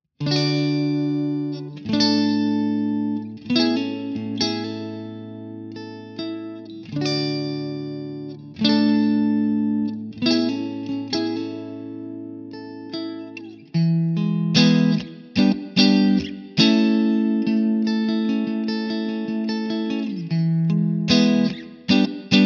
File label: clean; jensentone